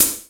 Sparkle Hi-Hat D# Key, Royality free high-hat sound tuned to the D# note. Loudest frequency: 9119Hz; hat samples